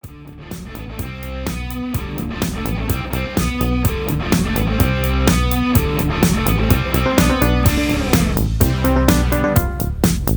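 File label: Instrumental with Leads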